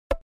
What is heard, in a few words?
favorite click